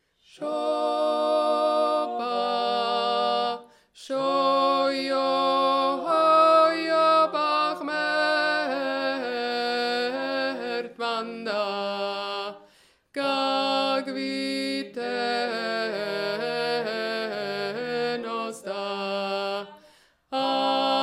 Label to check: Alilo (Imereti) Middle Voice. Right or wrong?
Right